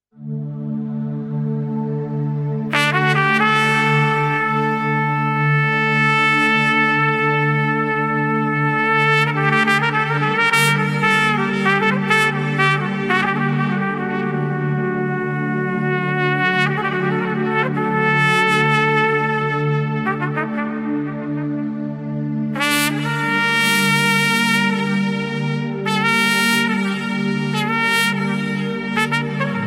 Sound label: Taknavazi Trumpet